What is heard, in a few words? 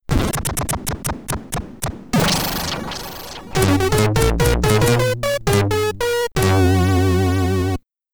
Drop & Game Over, SID Stereo; Mr. Heli; Commodore 64